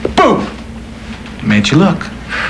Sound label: tpt boo; "The Pardoner's Tale"